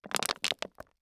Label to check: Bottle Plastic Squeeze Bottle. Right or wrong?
Right